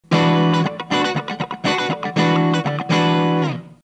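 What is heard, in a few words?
353, CLEAN, LF353N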